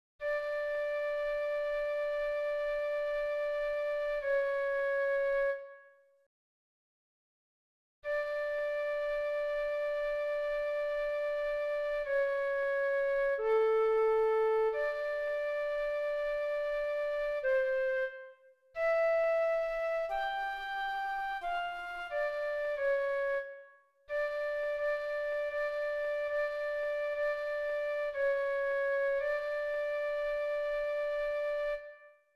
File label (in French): FLUTE; AGNUS st Jean